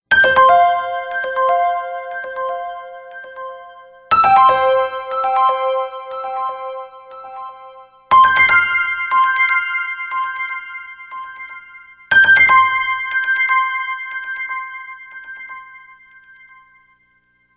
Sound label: Classic Tones, doorbell; bells